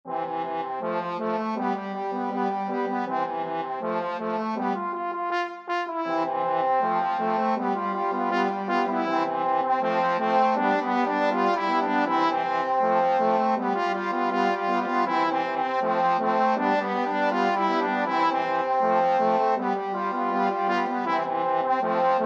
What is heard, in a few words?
la bamba, trombone quartet